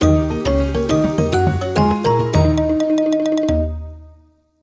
Round Complete; Tumblebugs

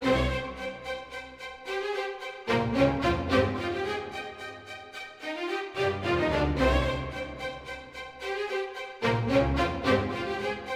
Garden Low Health

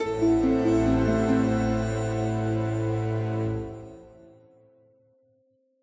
Puzzle Radio Success

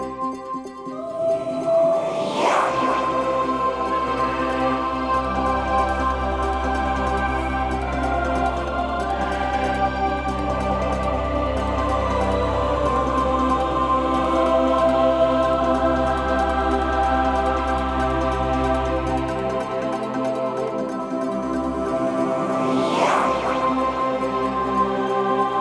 Player Winning

